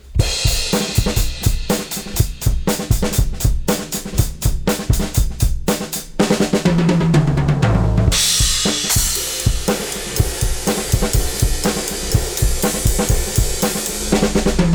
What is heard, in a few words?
drums; Punchy